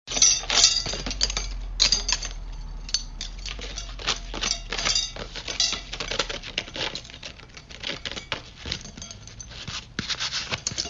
The Crashing

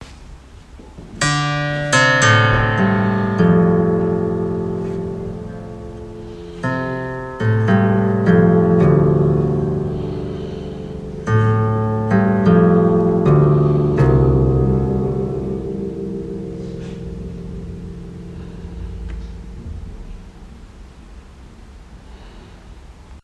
Chinese instrument Guqin

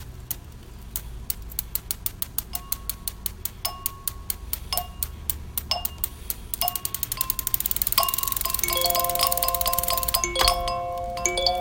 House Melodies

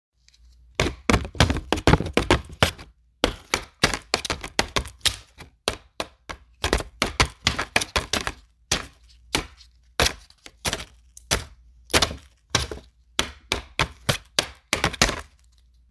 Drum

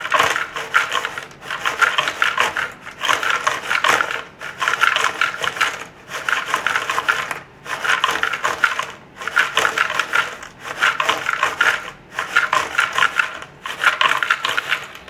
Puzzle

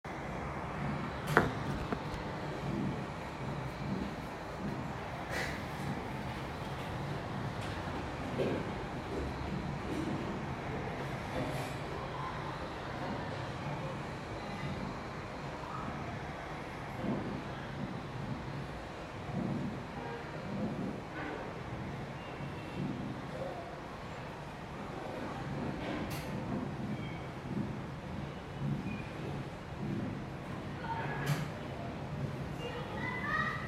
Kitchen

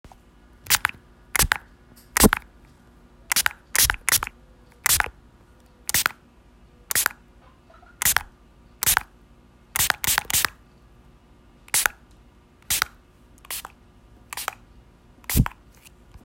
Spray Bottle

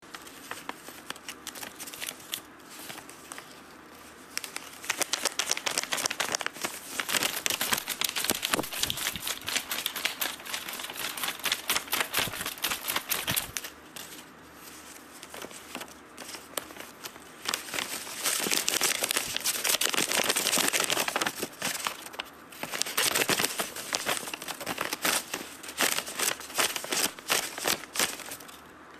Paper Instrument

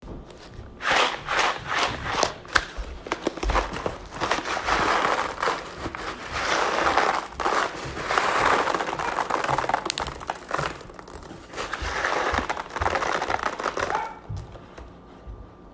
Coffee Beans

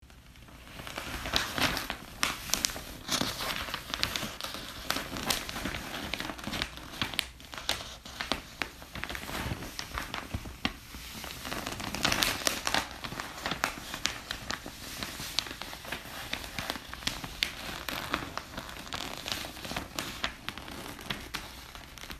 Paper Instrument

